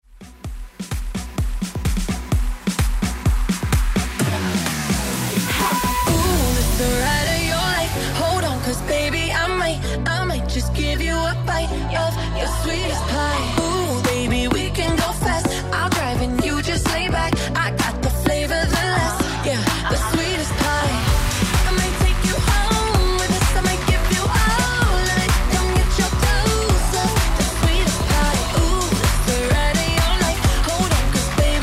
EDM

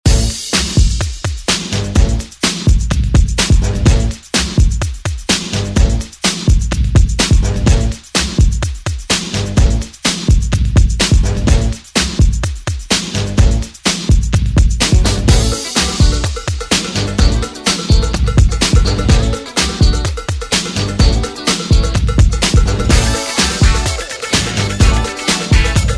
70s

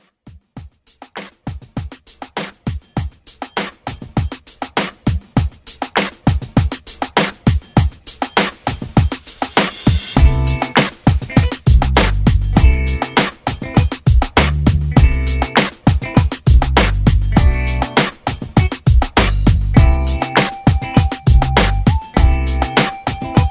RnB Re Drum

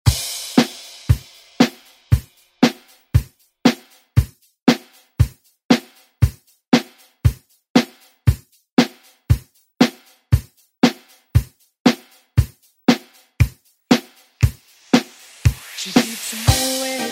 70s Re Drum

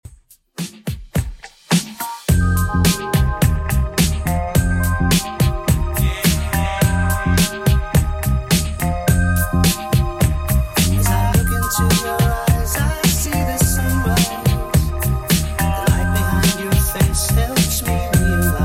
2ks Redrum 106bpm

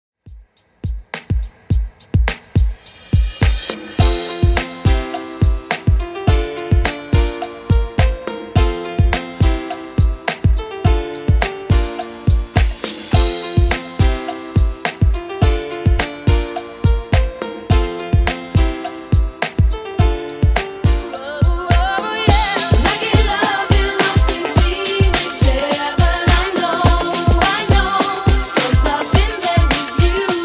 friendly redrum 105 bpm

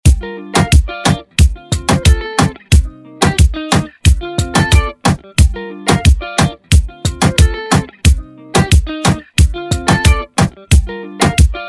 Afro Rton; Redrum

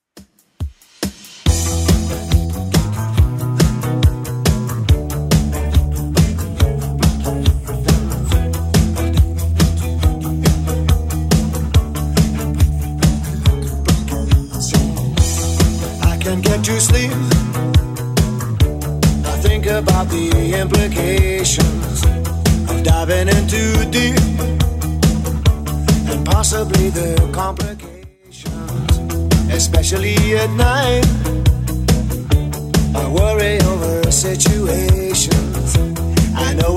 80s Redrum 140bpm

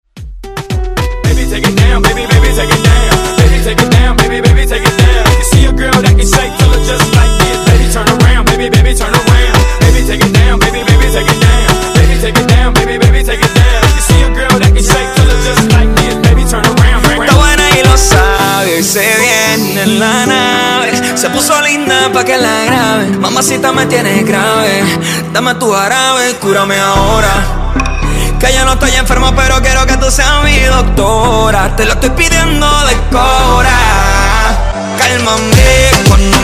Moombahton

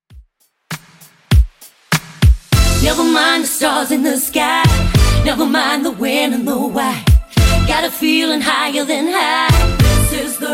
90s Redrum 99 bpm

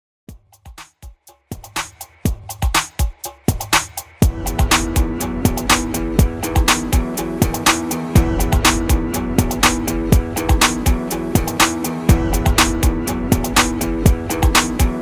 REDRUM 122 BPM CLEAN

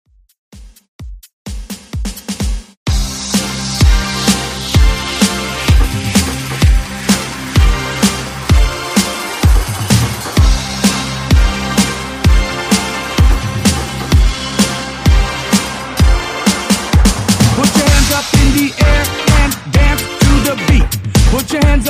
Hype Redrum Mix 128 Bpm

